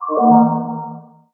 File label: launch up menu